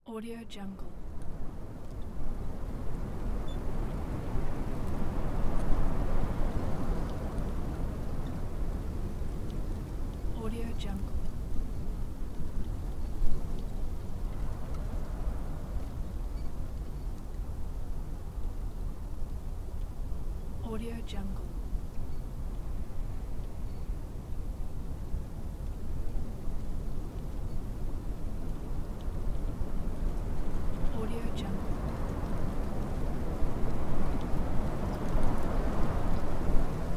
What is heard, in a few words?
a wind